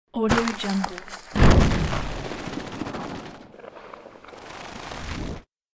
rolling spinning hit; صدای نورد آهن; industrial sounds

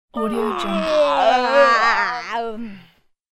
male and female synched yawn; human sounds

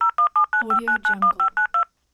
tone dial; صدای شماره گیری تلفن; interface sounds

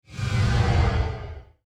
hollow whoosh by; transitions and movement

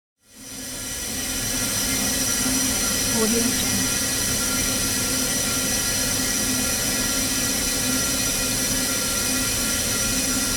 gas meter; industrial sounds

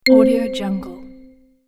ui notification

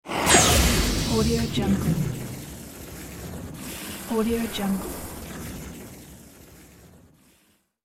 wet steam valve release; cartoon; cartoon sounds

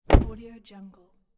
car door close; urban sounds

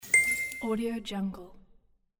lamp light on; دینگ روشن شدن لامپ; interface sounds

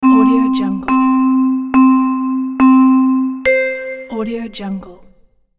video game start countdown

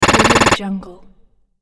cartoon drill; urban sounds

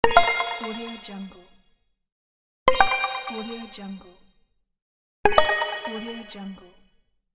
program message; interface sounds

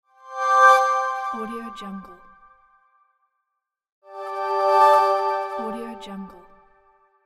soft notification; interface sounds; نوتیفیکیشن نرم و آرام

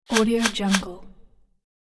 triple cartoon cut chomp

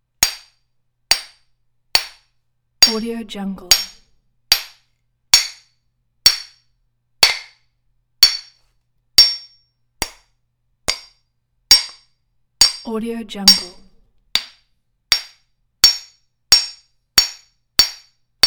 hammer hits metal; industrial sounds

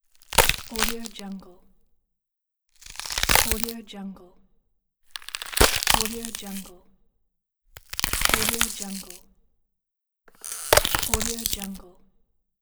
crack and break; شکستن و خرد کردن

